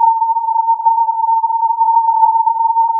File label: filtered input